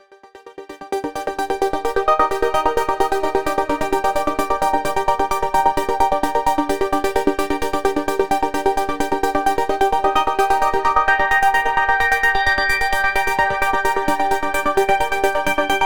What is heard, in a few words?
high energy progressive house